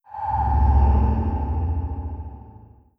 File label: ping wet, strider combat; creatures